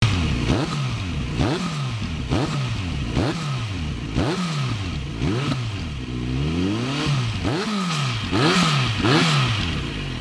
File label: Z900RS, exhaust